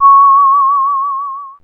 C#, Synclav FM, WIND; Big Fish Audio

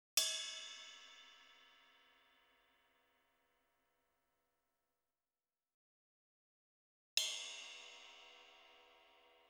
ride 1+2; Sound Sampling Collection VOL-5; Drums & Bass Fusion Funk Effect

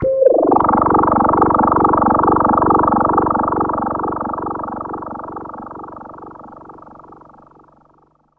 EFFECT, Explosive Jungle; USB Soundscan vol.05; AKAI

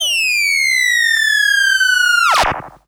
Classic FX; radiosonics; Classic Simple OSC FX

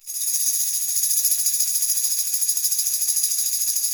TAM ROLL, NYC Percussionworks; Sampleheads; 90 s Sample CDs

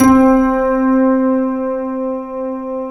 PNO HEAVN; EDENPNO

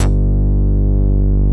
TECHNO, JD800 BASS; East West - Global Sounds

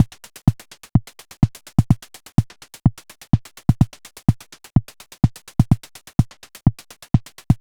Anabeat Mix, Beats

